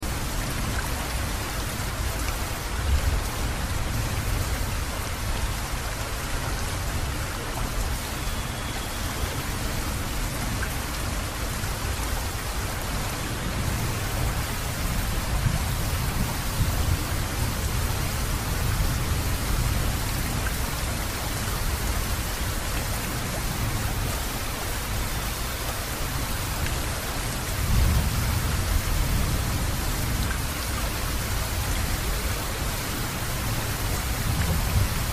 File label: Binaural 3D Sound Holophonic Rain